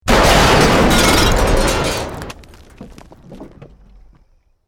Building Collapse Medium; Created dramatic effects of buildings collapsing or breaking; Construction

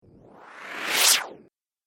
Thin Vacuum Sound, whoosh

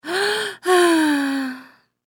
Human Female Sigh Inhale Exhale; Sighing (Sound Effects:Voice)

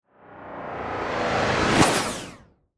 Warp Dimensional, Scifi, Science Fiction; Sci Fi Sound Effects; BlastWave

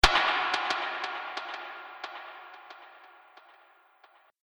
Repeat That Hit; Miscellaneous Impact Sound Effects

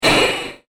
Seismic Laser Blast Rifle; Laser Gun Sound Effects; science fiction sound effect

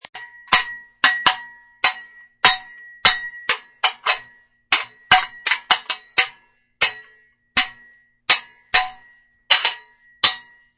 Toy sword fight.; Human

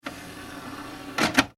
household dvd player tray close; Dvd Or Cd Player Tray Closing / Loading; Entertainment Systems; Electronics; McKinney Sound

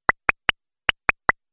Idea Bubble Pops, cartoon; Cartoon Sound Design

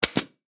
Pneumatic Stapler, Industry, Gun, Fire; Power Tool Sound Effects; Power Tools (Sound Effects:Tools); BlastWave

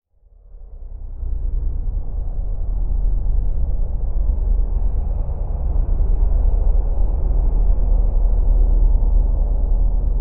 Drone Dark Wash Reverb Low; Blastwave

